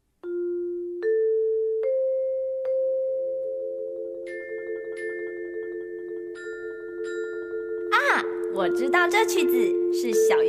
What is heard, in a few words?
dancing song